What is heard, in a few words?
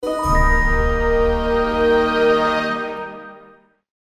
Minor Mission Complete